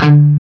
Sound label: CHUG D 2, Guitar; East West; Steve Stevens Guitar Samples Collection